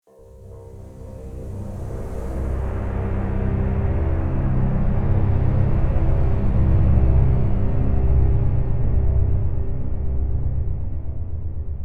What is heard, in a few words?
Tense (Stinger)